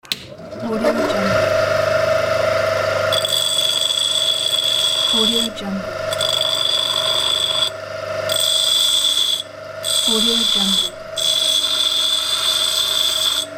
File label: industrial grinder machine